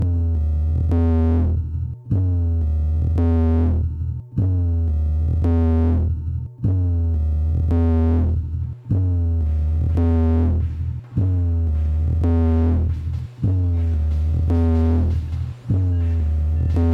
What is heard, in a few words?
sweet dreams of cricket crow, synth